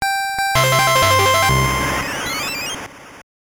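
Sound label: Jingle, Super Game Boy; Chibi Maruko-chan: Maruko Deluxe Gekijou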